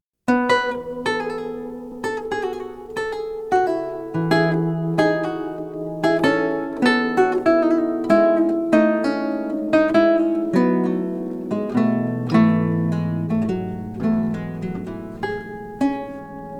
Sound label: Lute Partita in E Major, Loure; Bach, J S; BWV 1006a; II. Loure